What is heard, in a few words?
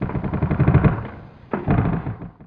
Creak Deep Wood; SFX Kit; Creaks, Scrapes, & Misc